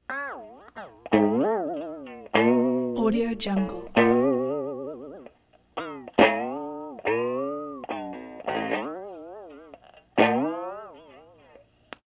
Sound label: boings wavering spring tones